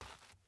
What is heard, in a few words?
dirt step; teardown; ps5 windows xbox series xs